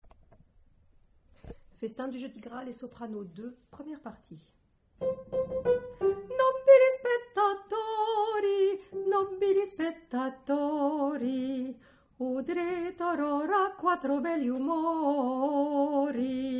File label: Soprano